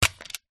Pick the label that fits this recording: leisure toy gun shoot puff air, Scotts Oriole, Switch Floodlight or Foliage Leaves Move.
leisure toy gun shoot puff air